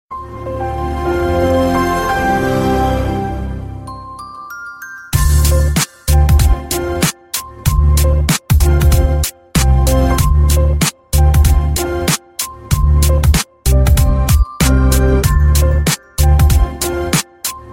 Windows Startup Remix Ringtone

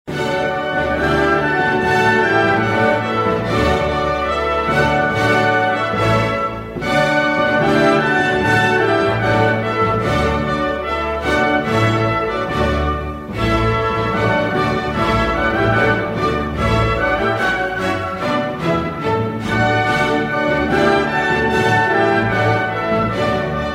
Magical Ringtone

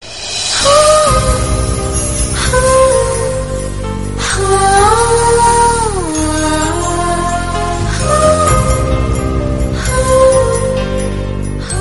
Love BGM Telugu Ringtone

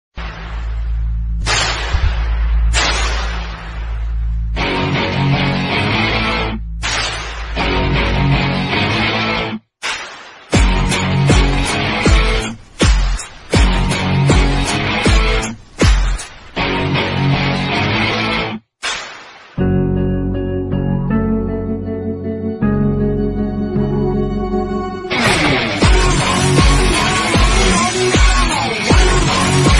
Tamil Bgm; Adithya Varma

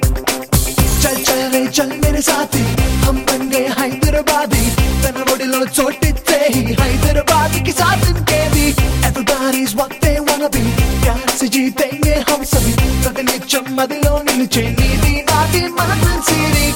Happy Telugu Ringtone

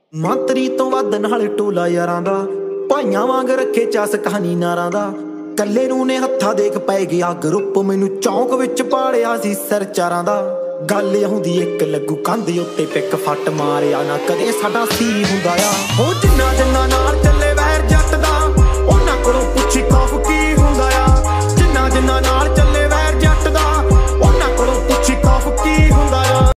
Punjabi Tune Ringtone

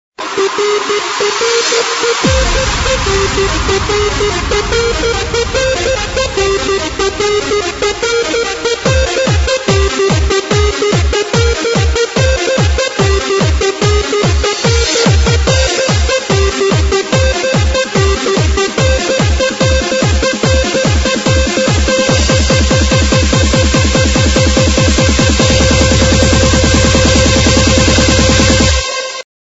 Techno Ringtone

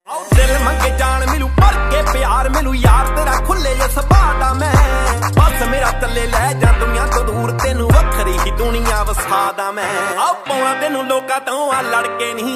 Punjabi Ringtone

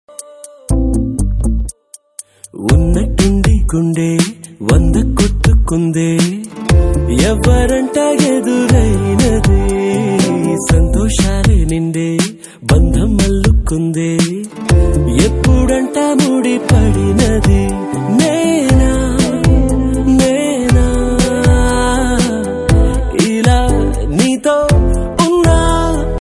Male, Telugu

